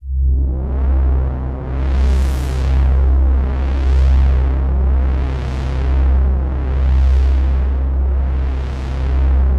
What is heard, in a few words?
Access Virus B; Synthworx